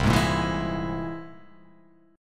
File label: C Augmented 7th; strummed; JGuitar